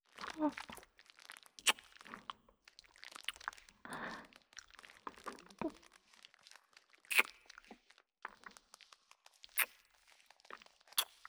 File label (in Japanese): 洗体耳舐め膝コキ愛撫; 不感同級生の弱点①